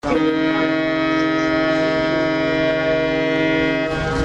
ship horn; cunard countess

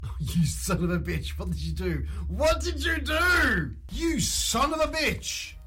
sob

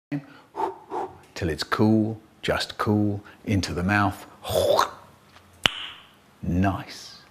clickity clackity

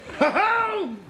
huhho

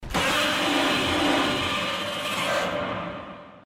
roar; godzilla 2021

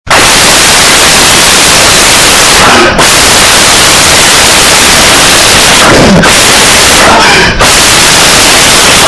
ear piercing

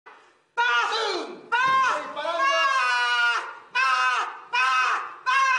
paaaaaaaaaaaaaaa pum paaaaaaaaaaaa

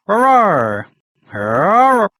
rawr rwar

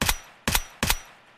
shooting

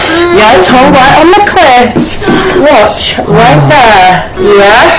tongue right on loud

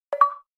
xbox 360 achievement sound

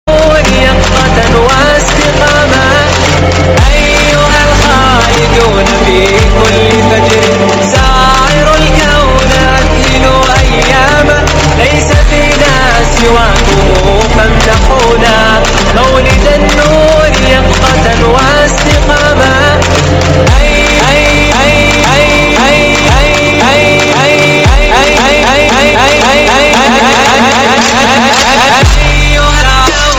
arabic trap

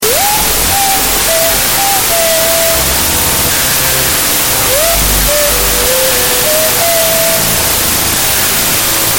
whistle

